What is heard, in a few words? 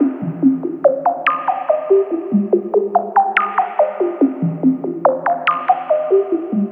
Percussive